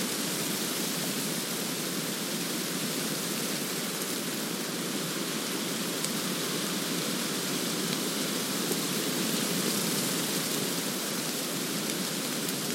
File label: Autumn Wind; hiberworld android ios online windows gamerip 2019